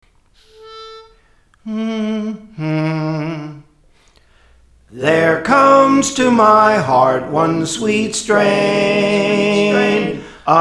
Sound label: Soprano; Praise For The Lord Training